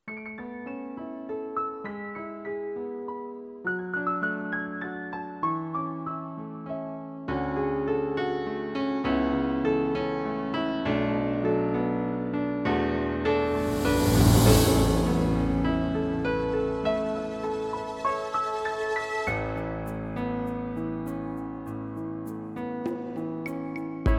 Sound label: clav piano